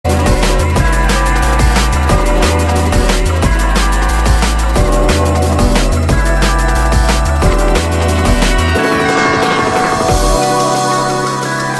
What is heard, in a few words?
Drum and Bass Jungle, UK Bass Radio